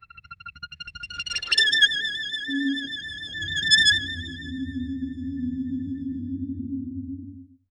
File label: sudden eerie swell light